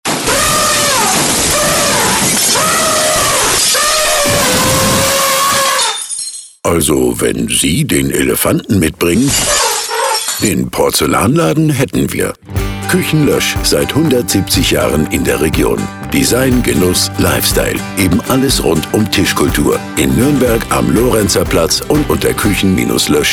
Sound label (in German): kachen lesch porzellan